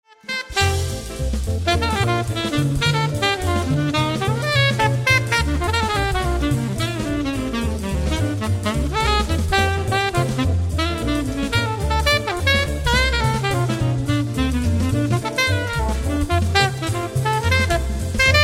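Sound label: Alto, sax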